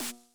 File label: snare